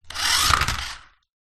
leisure radio controlled car accelerate away hit rug crash; zapsplat